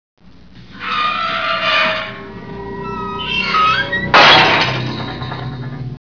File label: gate